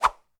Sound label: Whoosh, Airy